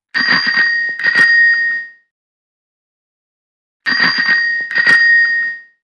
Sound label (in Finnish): soittokello